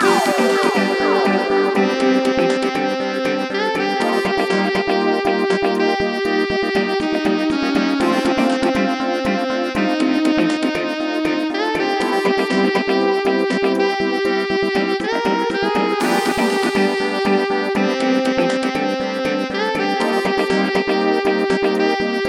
Standing By Boss Sax; Popslinger

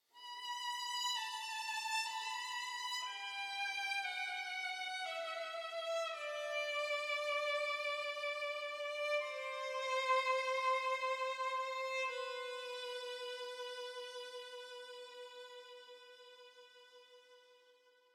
Stinger Gameover Positive Stem Solo violin; Victoria 3

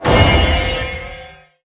Land Pendulum Middle; Se Land Pendulum Middle

